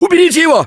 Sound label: scream while pounced; Gambler; russian